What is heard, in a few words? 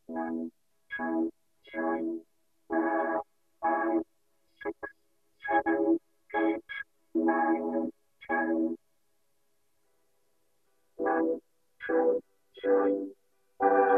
Vocorder; "Count 1 to 10 spoken" synthese input: guitar+effect, vocoder pure; flame2